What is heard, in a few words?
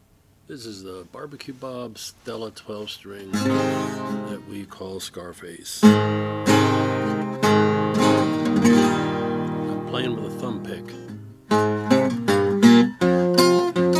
oscar schmidt 12 string natural; 1930